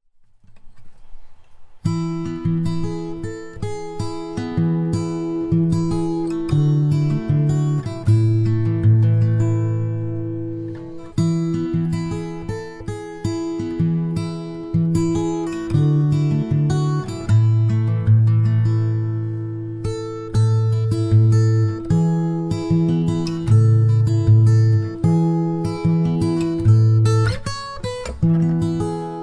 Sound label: Acoustic Instrumental; Everfree Radio